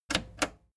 plastic button slack click slow

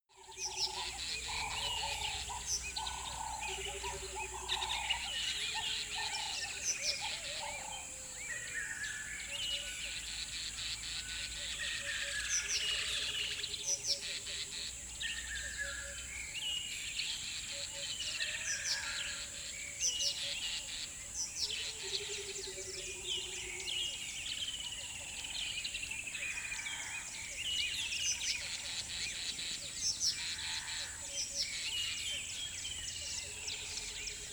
river bed dawn chirp soft

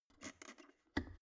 plastic water boiler close lid soft

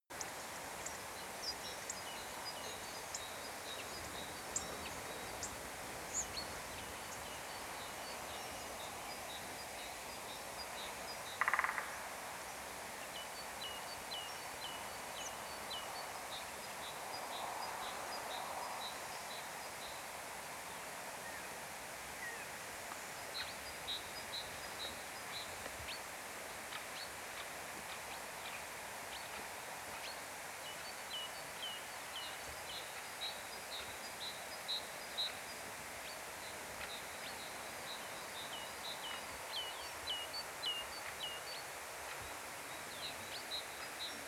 forest wind light birds

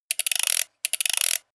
small wind up

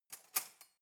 cutlery knife drawer grab soft

